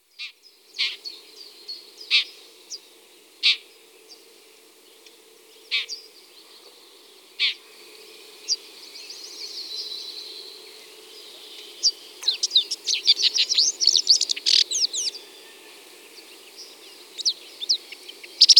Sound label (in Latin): Lanius collurio